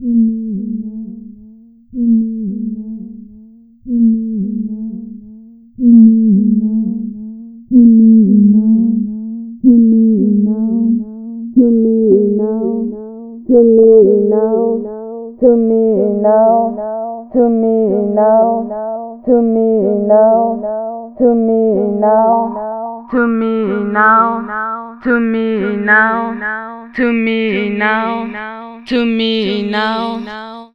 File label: LONG FILTER, Funky Vocals; USB Soundscan vol.18; AKAI; ELECTRON